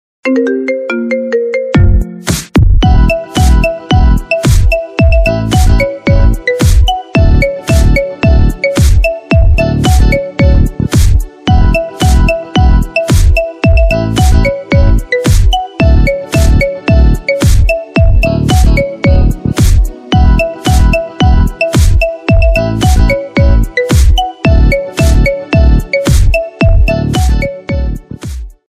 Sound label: marimba remix, marimba